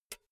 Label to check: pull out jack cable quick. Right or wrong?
Right